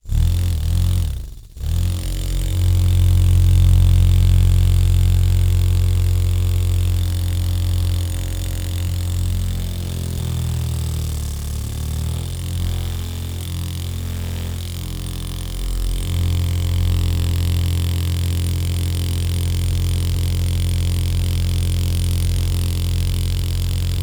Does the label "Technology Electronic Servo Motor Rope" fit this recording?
Yes